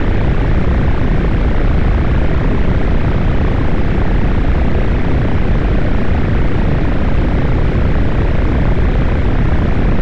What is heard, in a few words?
mirror music